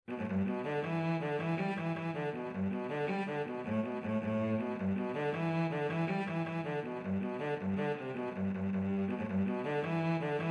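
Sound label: violoncello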